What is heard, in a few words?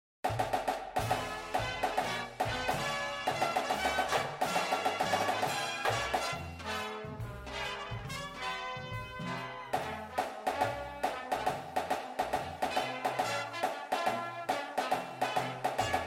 snare with music, drumline